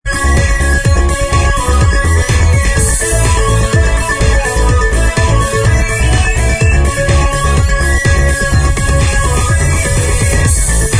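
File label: dance remix Instrumental Track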